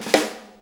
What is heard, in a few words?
4-4 Snare Flam, Drum Loops